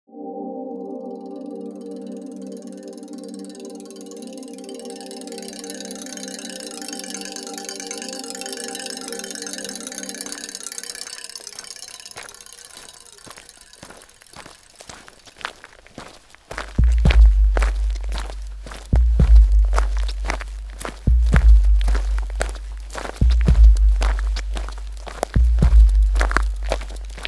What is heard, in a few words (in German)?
puls